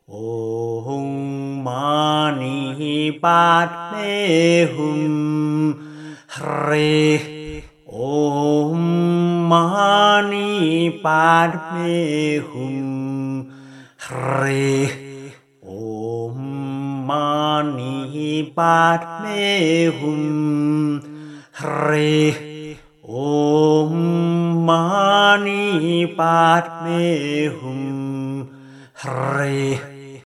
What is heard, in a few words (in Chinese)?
六字大明咒(唱頌); 四臂觀音; 又稱觀世音菩薩心咒